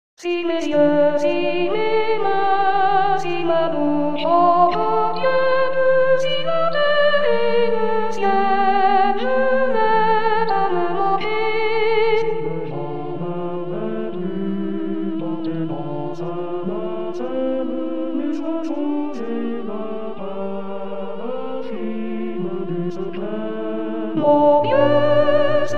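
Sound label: soprano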